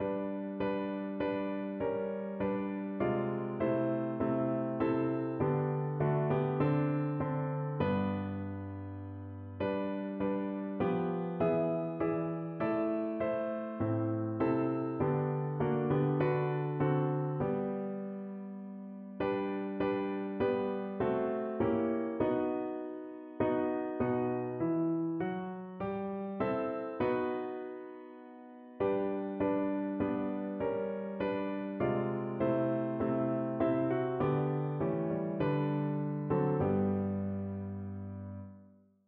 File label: little town, piano